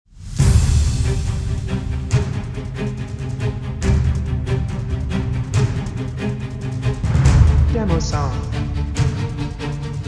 Alt Ending

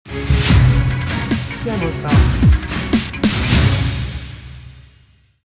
Stinger

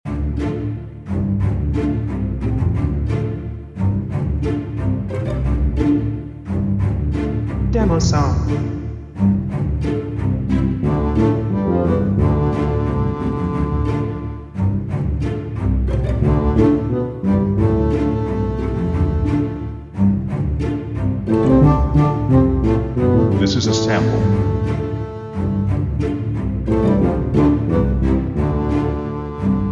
Quirky Little Tale Variant

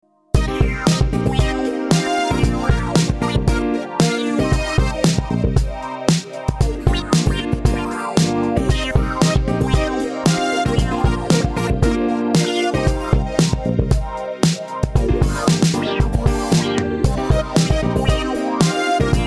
Funk; Funk My Touch

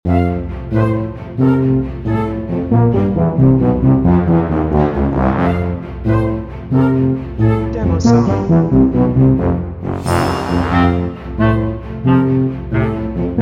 Bouncy Flute and Funny Tuba Loop

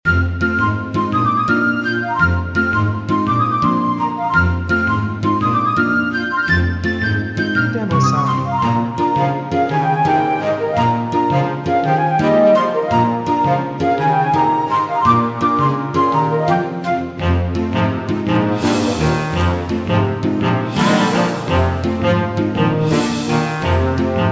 A Lively Playful Mood, Loop Bouncy Flute

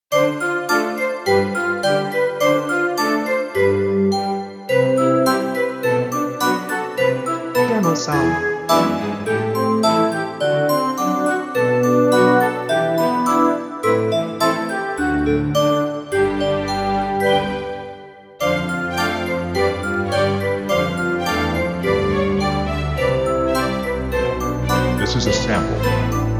Bouncy on Flowery Meadow

